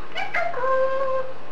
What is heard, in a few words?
"peekaboo", eclectus parrot